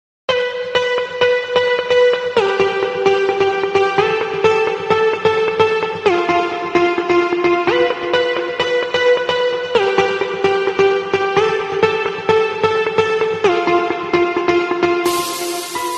Mobile Ringtone

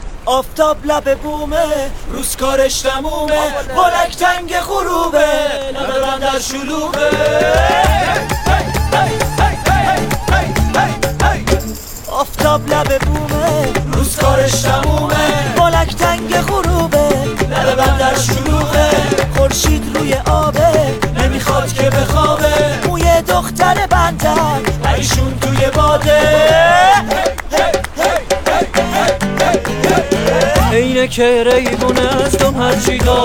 Guitar

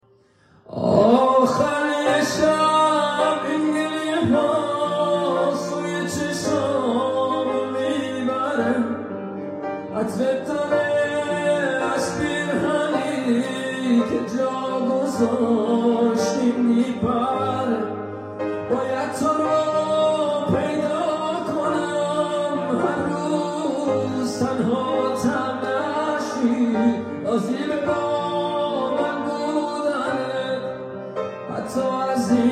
Piano

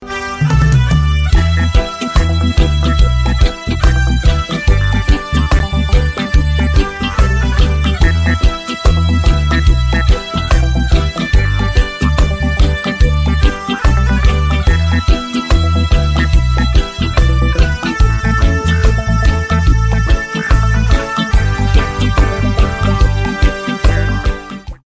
Mobile Ringtone

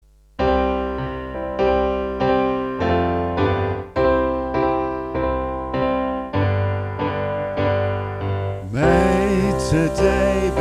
Happy Birthday Song

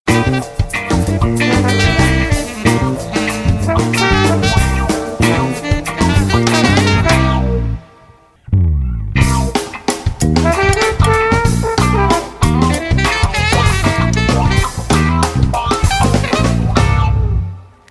Mobile Ringtone

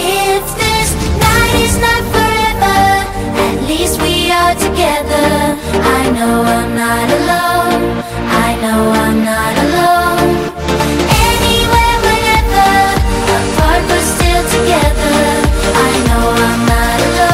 Mobile Ringtone

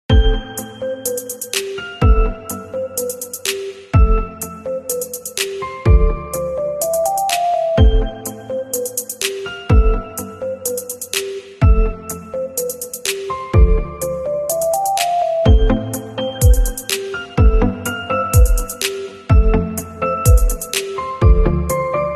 Mobile Ringtone

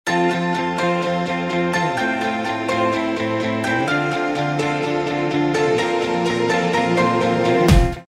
Mobile Ringtone

